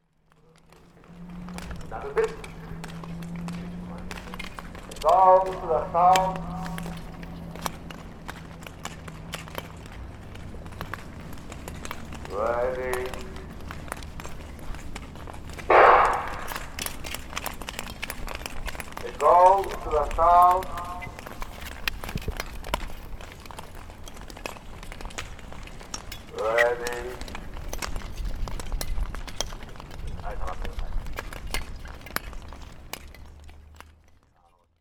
sukeeto rinku no oto; スケートリンクの音１; Chino Cultural Complex; 茅野市民館

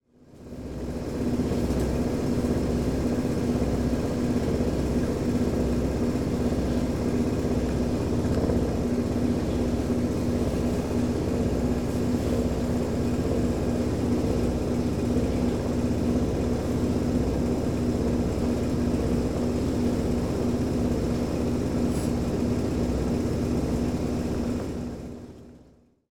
sentakuki; せんたくきの音

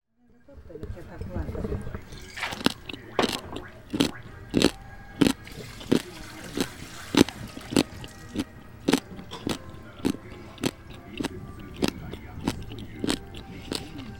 takuwan; たくわんの音; Chino Cultural Complex; 茅野市民館

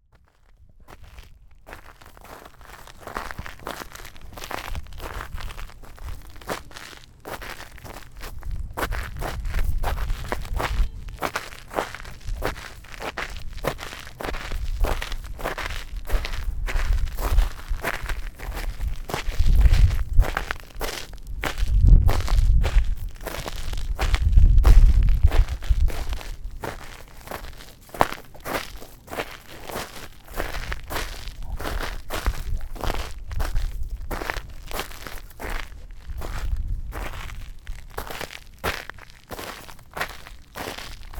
simobasira wo fumu oto; 霜柱をふむ音; 小池真紀; Chino Cultural Complex; 茅野市民館

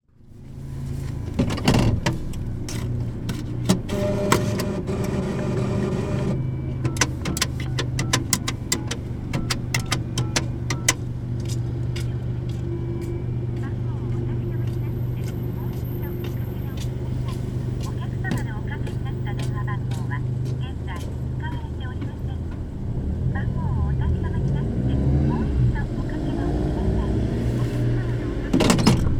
koushuudenwa kieyuku oto terefonkaado; 公衆電話（消えゆく音「テレフォンカード」）

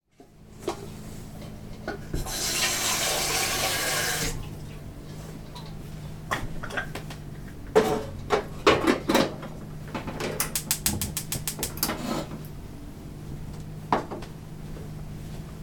koohii junbi; Chino Cultural Complex

